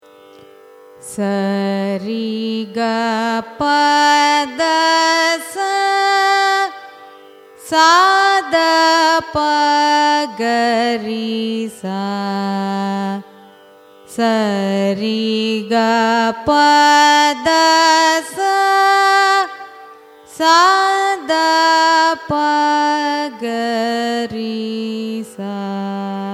raga sivaranjani arohanam avarohanam, carnatic music